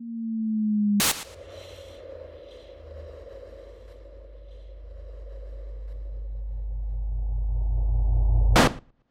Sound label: A gas wave weapon; 384 Sound Designs